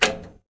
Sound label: button